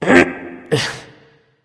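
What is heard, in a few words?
grunt lift, male; smite; voicepack; rama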